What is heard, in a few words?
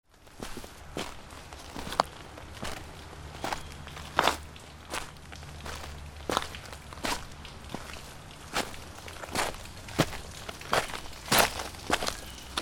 FOOTFALL